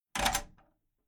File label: Household Old Farm House Door Closed Loose Metal Latch Rattle; Wobble Eerie; Creaky Door; Tom Hutchings